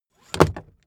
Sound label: car seatbelt buckle hit hard